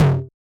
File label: Hard Tom 4, Yamaha DJX-2B